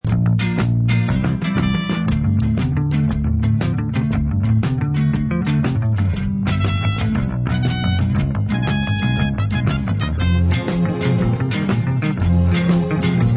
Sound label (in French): version instrumentale